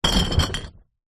household recycle bin plastic bottles card knock bash